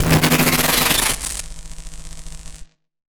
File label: Casio, Glitched, malfunctioning synth